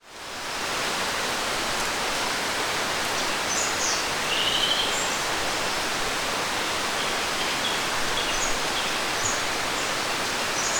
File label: nature forest ambience constant wind trees birds fly buzz close